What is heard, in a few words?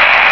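click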